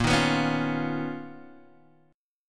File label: B Major Sharp 11th A sharp